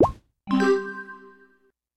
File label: Winning Reward Item Add